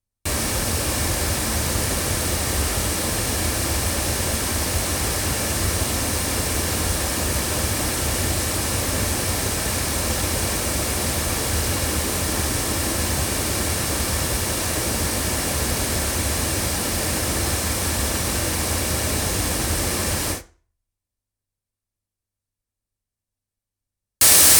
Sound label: Milab VM-44 loudspeakers